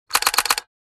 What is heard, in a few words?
Professional camera shutter multiple shots